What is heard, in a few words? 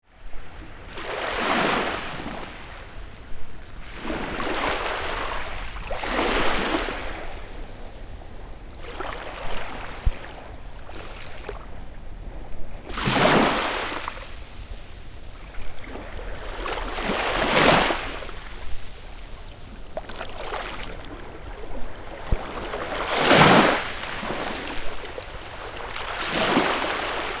Beach ambience morning waves quiet and calm